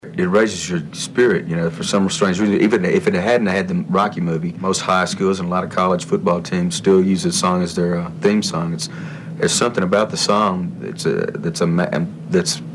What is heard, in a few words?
singer